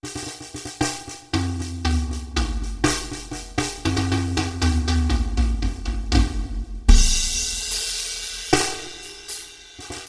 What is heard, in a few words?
Drum Solo; TSMtoolbox